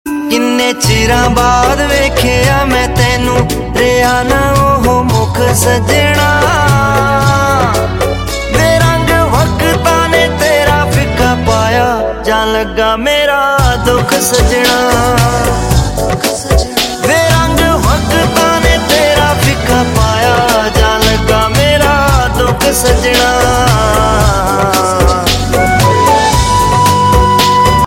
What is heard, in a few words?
Punjabi New Sad Tones